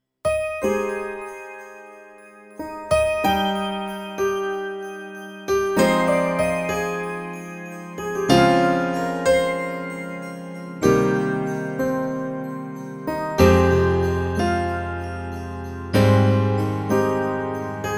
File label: FP-50 Dreamy Piano